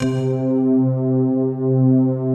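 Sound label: glass waves; JV PADS; Pro-Rec - Synthsonic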